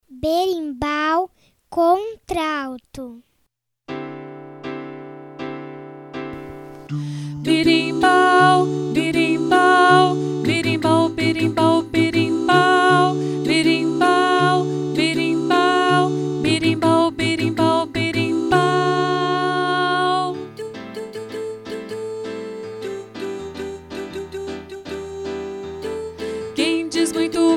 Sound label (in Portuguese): berimbau naipe contralto; 4 VOZES